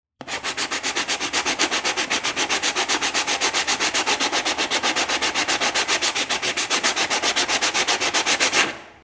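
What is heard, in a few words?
sandpaper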